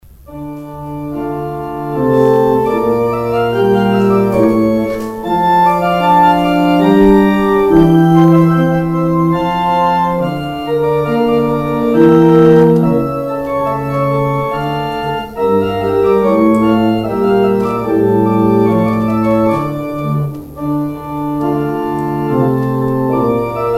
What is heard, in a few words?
organ outro; Organ exit music